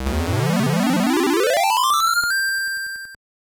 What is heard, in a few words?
Jingle, soundtrack; Uchuujin Tanaka Tarou de RPG Tsukuru GB2; GBC